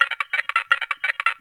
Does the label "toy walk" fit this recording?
Yes